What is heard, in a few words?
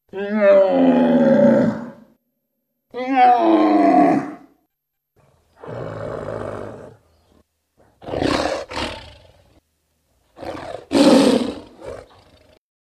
Monster Growl Roar, vocal elements; Monster Growl And Roar